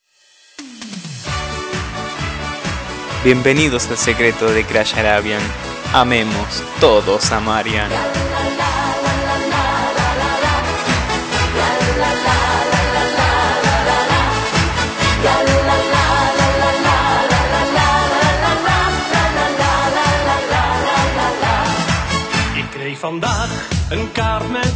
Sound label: sound arabian; deathrun crash2; cstrike